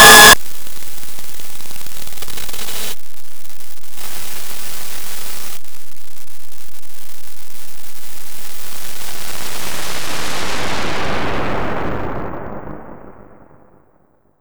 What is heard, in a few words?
sonar mega ouch; casualtiesunknown